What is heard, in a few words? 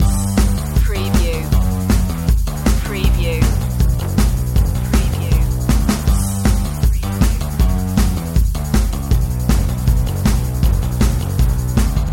dirty fun loop